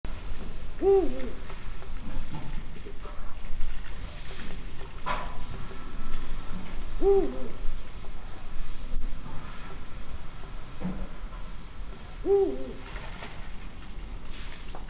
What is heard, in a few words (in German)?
UHU